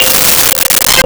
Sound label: Glock C4; Nintendo; July 2020 Gigaleak